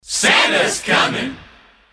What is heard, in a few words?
christmas kit group shout santas coming